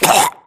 grunt death neck; Titanfall 2

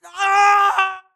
grunt death crush; Titanfall 2

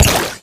imp bullet lightballistic shieldwall; Titanfall 2

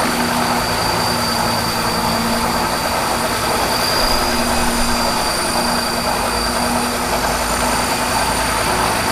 drone idle; Titanfall 2

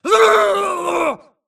grunt electro; Titanfall 2

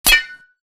imp bullet light ballistic titan; Titanfall 2

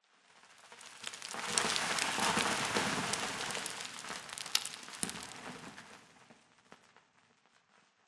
Explosion Rumble Exterior Close Debris; Boomtown; Titanfall 2

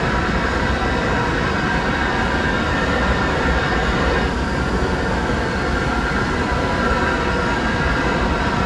Prop Warehouse Prop Rack Move Dist; Titanfall 2; Boomtown

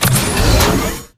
atlas eject servos; Titanfall 2

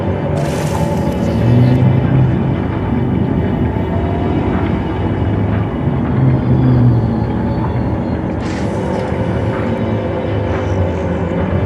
Robot Arm Track Med Mid; Boomtown; Titanfall 2

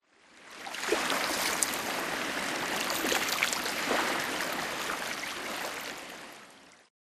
swampland water lapping; Titanfall 2

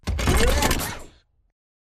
combat run to idle; Titanfall 2

